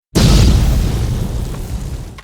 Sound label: big explosion cut off